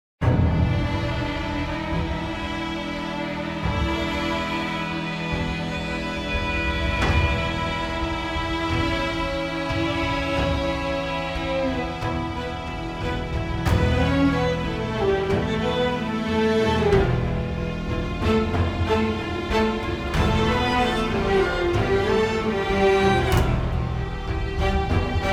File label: Desert Strings